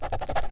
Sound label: paper scribble